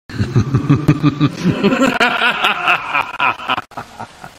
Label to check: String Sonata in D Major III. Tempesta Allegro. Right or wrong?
Wrong